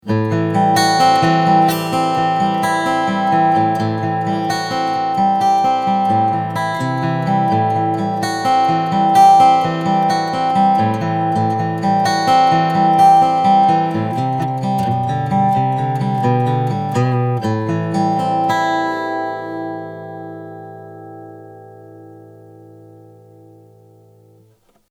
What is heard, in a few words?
eq middle mic body